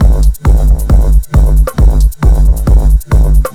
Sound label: Drums Techno